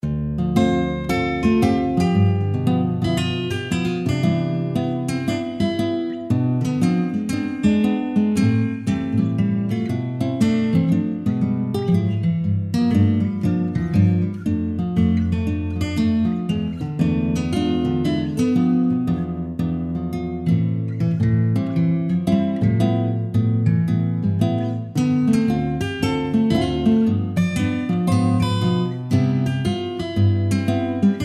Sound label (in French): GUITARE